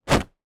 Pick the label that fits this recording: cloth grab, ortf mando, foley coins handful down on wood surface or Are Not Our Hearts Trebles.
cloth grab